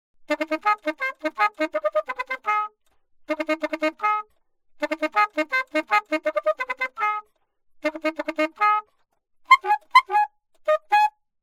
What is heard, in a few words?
altosax